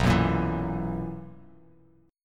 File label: B Major 7th Suspended 4th B down; BM7sus4; strummed